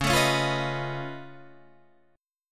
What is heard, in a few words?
Db Augmented 9th Db down Guitar Open D